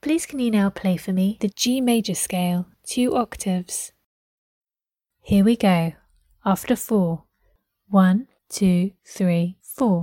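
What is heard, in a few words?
G major 2 octave scale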